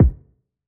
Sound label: Hiphop kick; Reverb+EQ+Comp+Limit